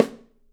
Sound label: Drums, MARCHING; 3D Drums; OP MARCHING